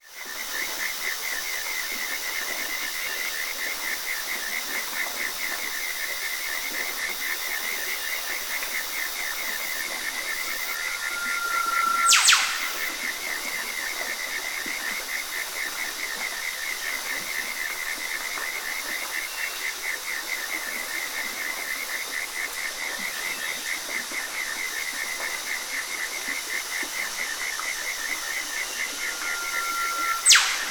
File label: rainforest evening ambience australia stream insects birds eastern whipbird; zapsplat